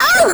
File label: squish; Crypt of the NecroDancer